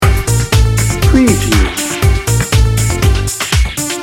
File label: party in motion loop